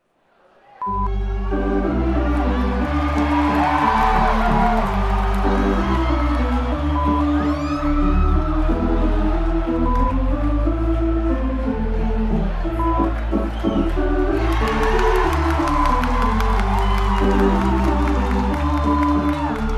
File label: baseball Music Crowd